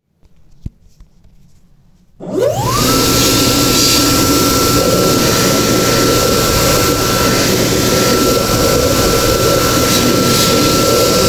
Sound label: turbine